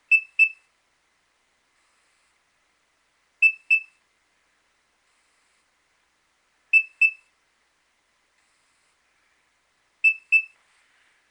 nokia3100 ringtone

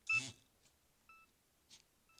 nokia 6100 ringtones groove

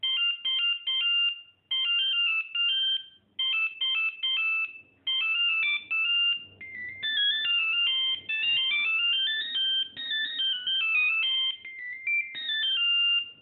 nokia 1100 mexican hat dance ringtone

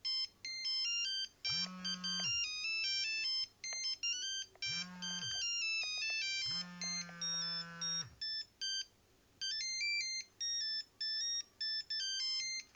nokia 5510 ringtones sunny walks

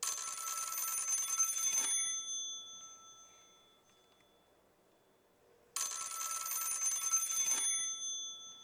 trimline ring

